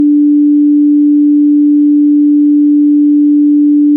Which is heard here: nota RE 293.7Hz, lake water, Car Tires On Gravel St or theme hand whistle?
nota RE 293.7Hz